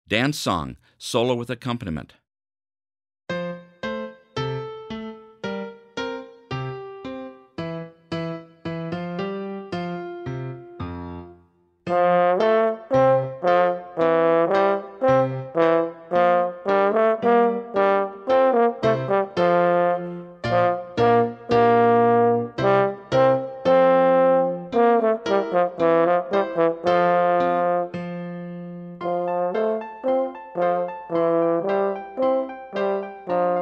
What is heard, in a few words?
fhorn dance song solo & piano